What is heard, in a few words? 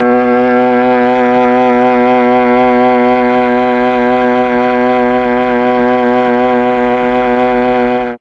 B1 viola, mellotron